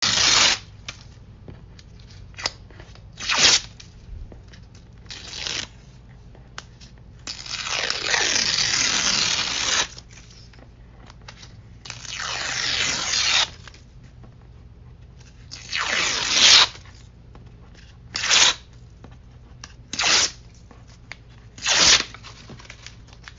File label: Ripping